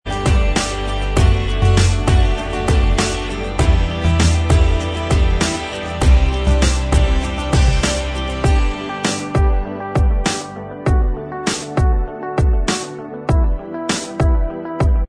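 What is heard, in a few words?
instrumental, track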